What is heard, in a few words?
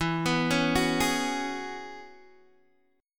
E 6th Add 9th E down Guitar Open G